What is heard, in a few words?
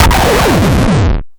Scratching FX